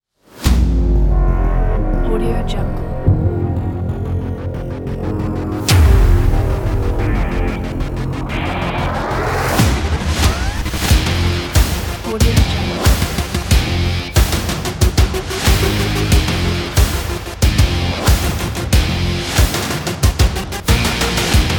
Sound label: impressive trailer